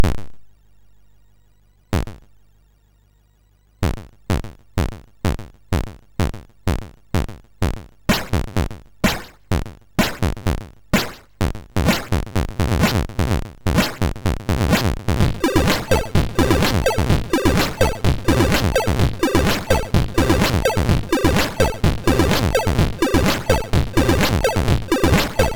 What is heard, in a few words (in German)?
Kirchenlied MOS6581R3; sid